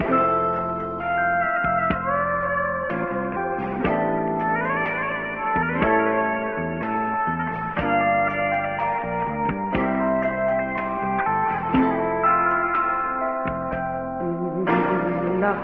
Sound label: keys, phonky loops